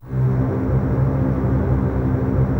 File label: C 2, Basses Tremolo, String Ensembles; Miroslav Vitous; 90 s Sample CDs